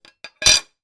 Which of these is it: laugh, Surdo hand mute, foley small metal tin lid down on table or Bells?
foley small metal tin lid down on table